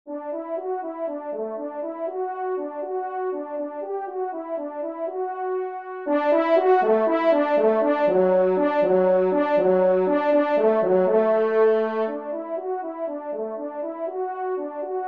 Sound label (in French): trompe; Rallye Bouscatel; Gourdin; 3e Trompe